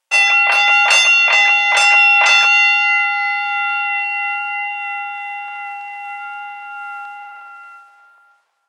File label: Boxing Fight Bell; Sound Effects:Sports